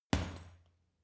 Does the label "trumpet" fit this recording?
No